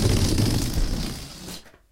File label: deflate; To the Moon